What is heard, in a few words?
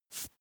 makeup stroke apply single; haus-garten; badezimmer